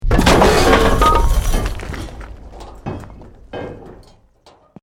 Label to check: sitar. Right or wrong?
Wrong